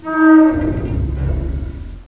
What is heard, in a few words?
rocket groan, ambience